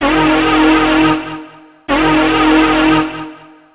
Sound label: Alert